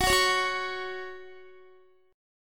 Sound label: Gb 5th Gb down Guitar; Gb5 strummed; JGuitar